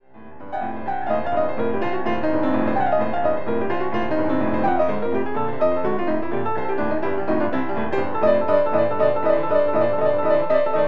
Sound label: Stomp